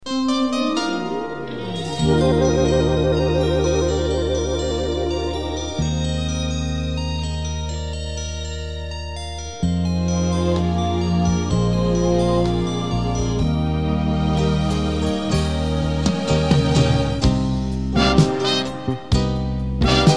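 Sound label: Real Radio Jingle Instrumental; WCCO AM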